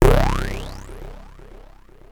Access Virus B; Synthworx

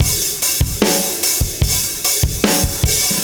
Pumpkin fx, 074, Beat Loops; Zero-G; Monster Beats

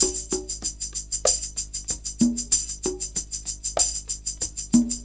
Percussion, R&B Drums; Sonic Foundry (Sony Creative Software)

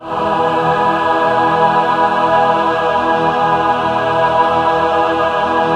BIG MAJ E4 L, BIG CHORD, Symphony of Voices

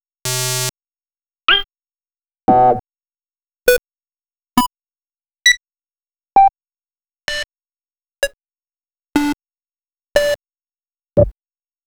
bleeps & blips; X-Static Goldmine; ravers (chains)

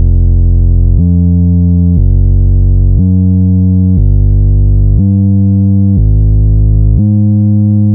Bass Line; Eclectic Electronica

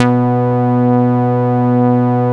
MOOG B3F; 90S MOOG

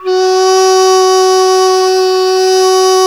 qu di F#4, flute; Spectrasonics; Heart of Asia

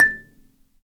Marimba, -; Roland LCDP11; Africa VOL-1; Af.Marimbas

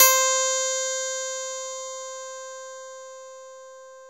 BRITEKLAV, KURZWEIL K2000

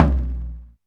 DM5 Perc, Alesis DM5 drum machine

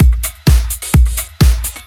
Clubhouse Loop 128 BPM

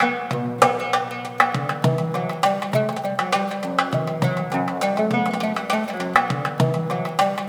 OUD DUMBK, OUD PERC; Spectrasonics; Heart of Africa 2

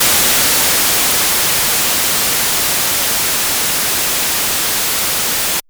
MiniBrute Raw, White Noise Raw; noise hiss crackle

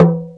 talking drum; Greytsounds; EXOTIC PERC

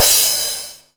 crash, Dance Mega Drums; Best Service; CRASHES

